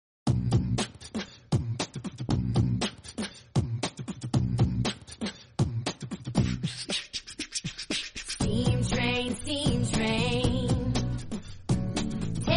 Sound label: Steam Train; hula hoops boom whackers lesson